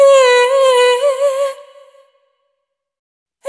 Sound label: Servitude, vocal samples; Sonic Foundry (Sony Creative Software) - Pocket Diva World Class Vocal Samples; Op-Erratic Loops